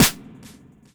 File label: Snare, drums; Ableton Live 12 Lite Core Library